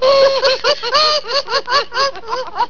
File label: laughs